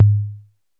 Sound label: 808 CNGL, Electro Samples; Central Processing Unit